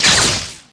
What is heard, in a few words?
imp bullet lightballistic shieldwall sizzle; Titanfall 2